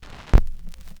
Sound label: Needle Drop, Vinyl Record Samples; Needle Noises